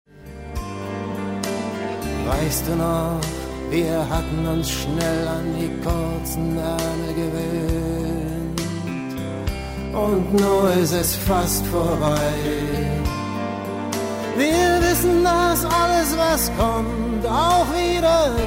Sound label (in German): Gesangs Mix